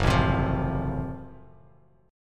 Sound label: Gb Minor Major 7th Flat 5th, Bass Standard, chord; strummed; 2,0,10,10; JGuitar